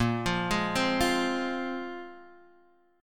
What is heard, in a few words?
A sharp 7th Suspended 2nd down Guitar Half Step Down